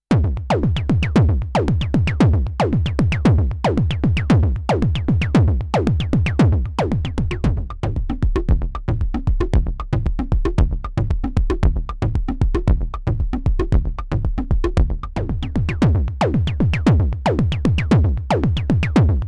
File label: DFAM vs Edge Sequence